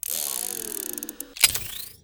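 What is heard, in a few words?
cylinder spin; cstrike; valorant; neofrontier sheriff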